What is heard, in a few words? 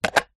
foley battery into plastic battery charger